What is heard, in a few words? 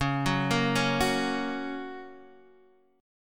F sharp Major C sharp